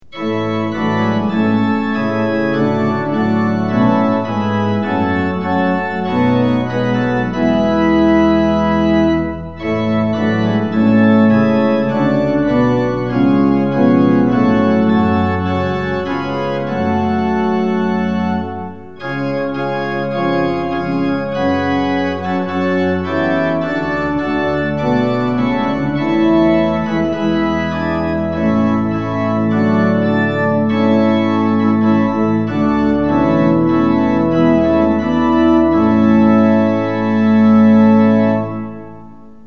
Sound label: tune; hymns; lillenas